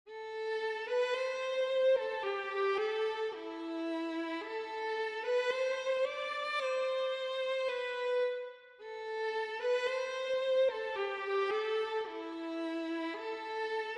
Violon

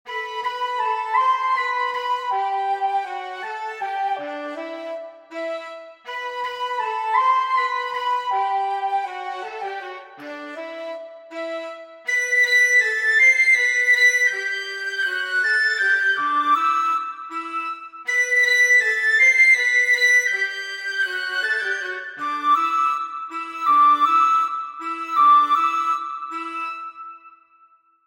focus Flageolet; Les Valkyries

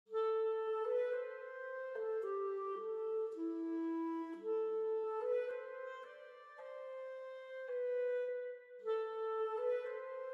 Clarinette en Sib

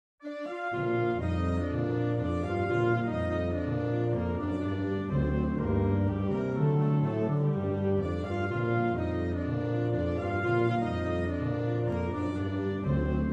Orchetres Focus Sousa; Les Mysterieurses Cites d'or

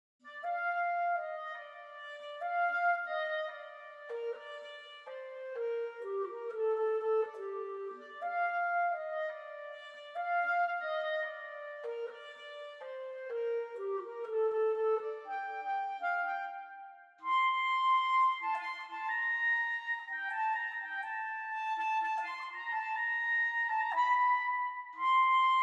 Clarinette en Sib; Les Mysterieurses Cites d'or

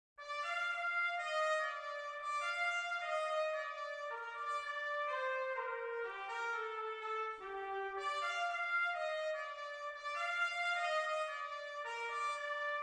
Trompette en Sib; Les Mysterieurses Cites d'or

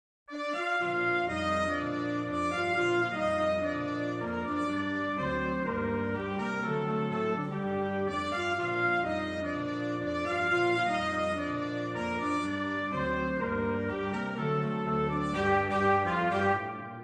Orchetres Focus Trompette; Les Mysterieurses Cites d'or

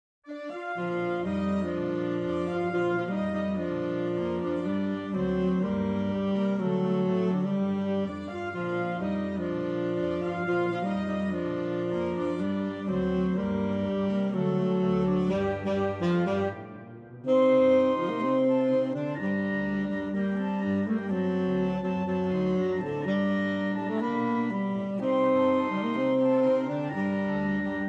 Orchetres Focus Sax Tenor; Les Mysterieurses Cites d'or

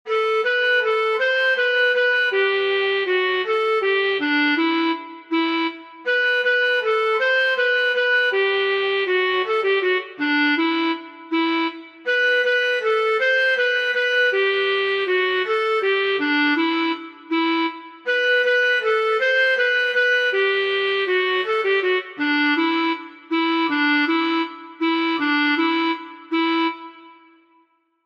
focus Xaphoon; Les Valkyries

